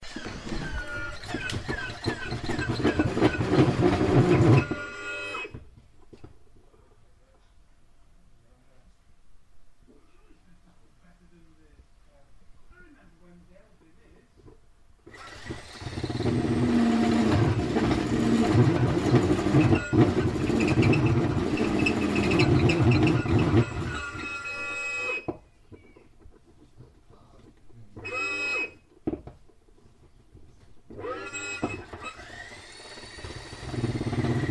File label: desperate sounding hammer drill